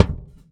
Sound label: Rumble, Kicks; Airwindows